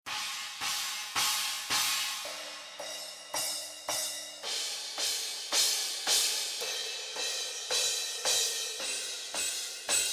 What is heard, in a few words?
CYMBALS